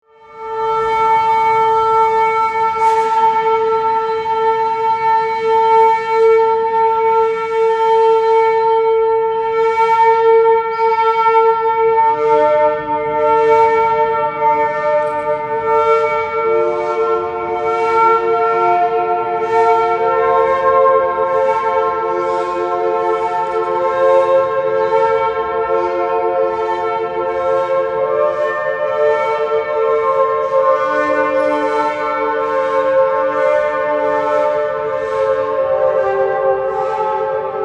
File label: Improvisation; listening station cd